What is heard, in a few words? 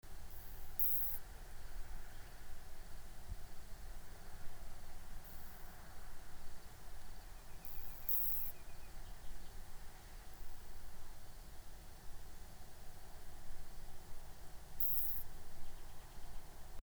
Isophya modestior, Thermenlinie